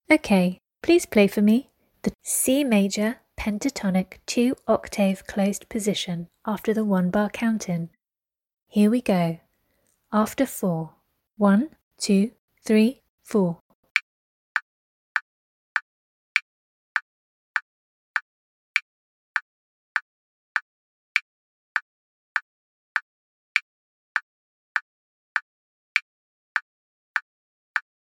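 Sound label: c major pent 2 oct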